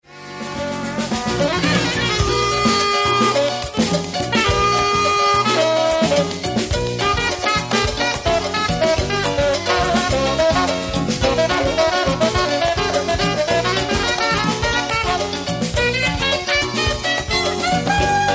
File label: New Orleans Solo